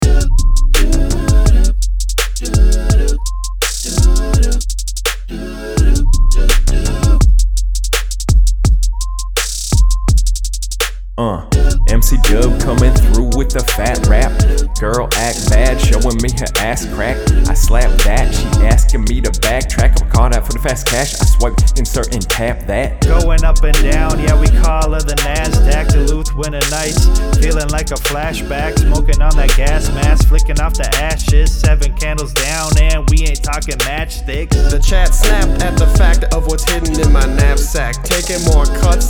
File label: 4 bar BANGER